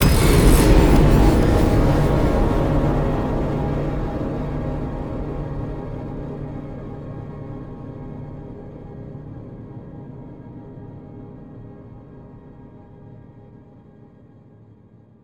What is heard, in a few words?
STING Hard Strum Slammer longer; Cinematic Metal Impacts